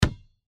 ball bounce single

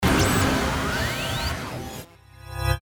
Blast Tonal

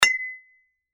bat hit

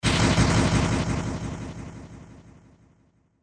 Blast Delay

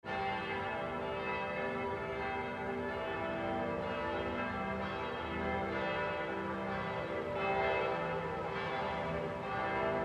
church bells

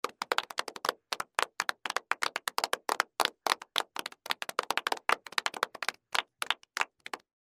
Applause Crowd

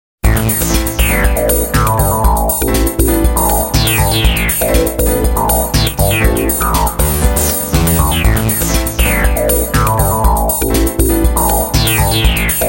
Segue; Soundzabound

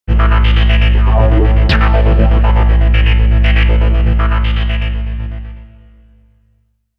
Deep Electro Trans; Soundzabound

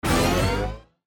Ascend Burst

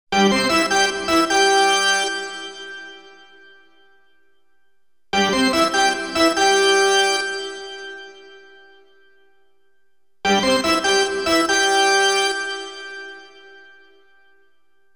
Charge repeating; Soundzabound Volume 2 Disc 1

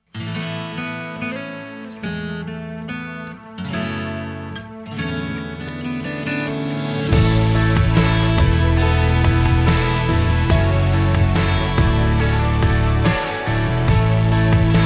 Instrumental

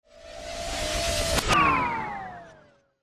Ascend Descend

